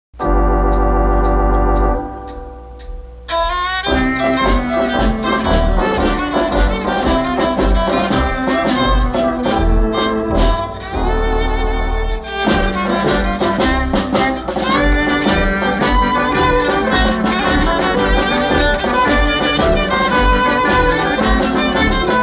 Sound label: instrumental